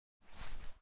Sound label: USP Holster; zps; Weapons; Firearms